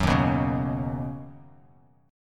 D sharp Minor 7th Sharp 5th D sharp, down, Bass Standard; D#m7#5 strummed